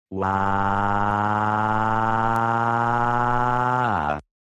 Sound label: crying; ludoviko esperanto sound